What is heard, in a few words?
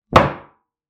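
door slam; hlopok dveri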